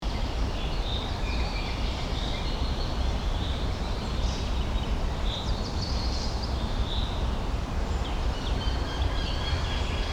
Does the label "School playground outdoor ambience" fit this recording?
Yes